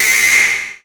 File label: SOUND EFFECT, Metal; AKAI S6000 CD-ROM - Volume 1